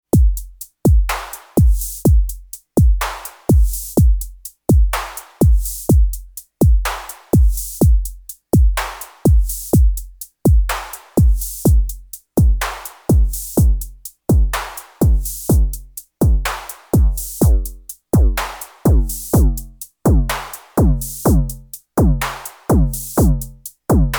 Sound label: Trinity Block Mode; Modbap Modular